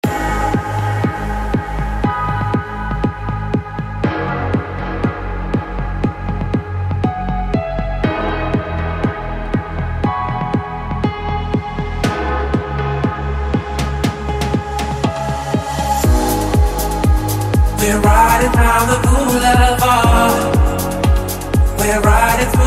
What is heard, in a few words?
Afro House Remix